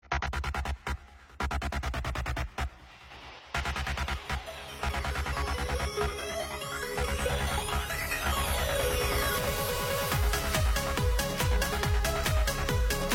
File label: football music